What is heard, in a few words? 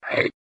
chomp